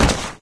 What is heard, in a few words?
flesh impact hard